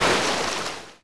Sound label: Ocean Spray; Civ4 warlords